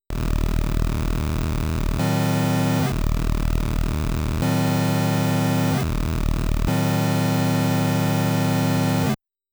Incoming Sound; Giga, Mega; Motos

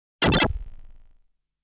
shooting sound

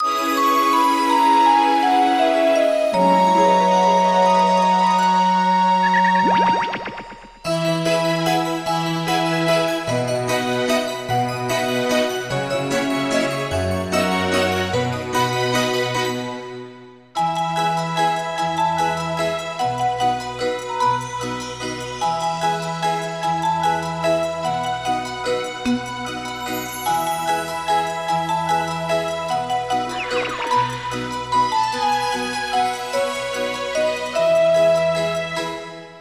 Karaoke; doko demo issho toro to nagare boshi; ps2

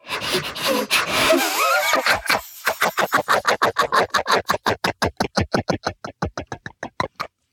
giggle

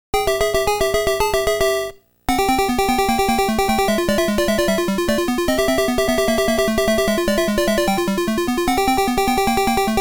BGM (Hurry Up); Game Sound Museum Namcot; Dig Dug; Dig Dug 2

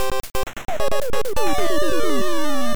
Reggae Card, Unlucky; family computer

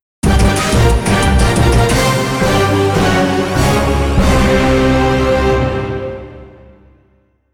Game Over Jingle; Shin Contra original soundtrack

